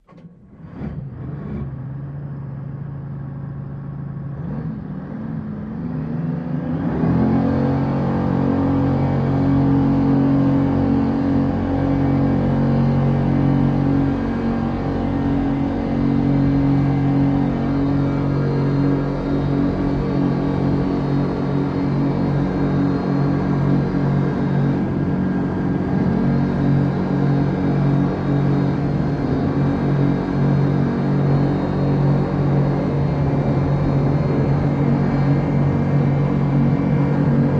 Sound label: Military Pbr: Onboard Start, Acceleration And Maneuvering. Dual